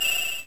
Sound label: Advance Coin